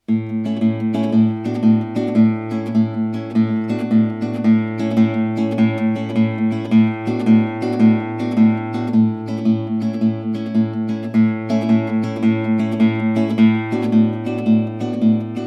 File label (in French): instrumental; Au parc